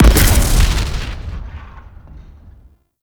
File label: Sticky Bomb Proj Explosion; Grineer; Veilbreaker